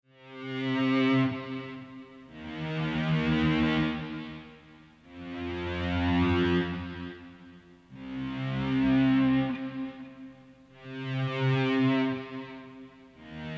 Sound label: electromagnetic swells